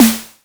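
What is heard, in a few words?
Snare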